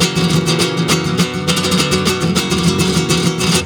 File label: GUIT BREAKS; Best Service; World Colours